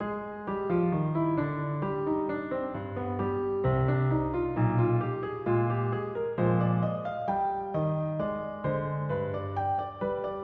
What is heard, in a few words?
ancien style